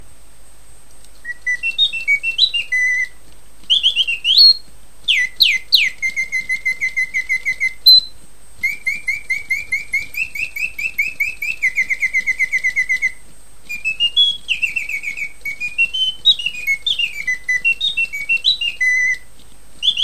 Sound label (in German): rundkaefig zwitschern; griesbaum; ab 1950